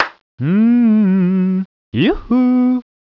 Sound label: man dance; cstrike; cso emotion